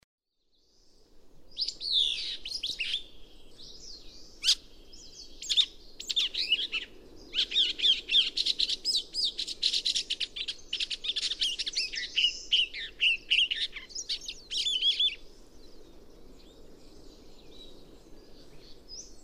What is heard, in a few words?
komukudori, bird